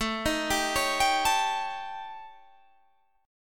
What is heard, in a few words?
Eb 7th Flat 5th A, guitar